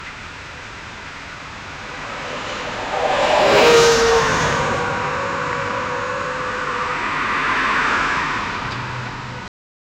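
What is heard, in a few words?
screeching wheels as a car, wet asphalt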